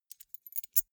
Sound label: key ring small place soft